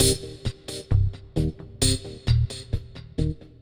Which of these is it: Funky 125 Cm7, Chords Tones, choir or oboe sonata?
Funky 125 Cm7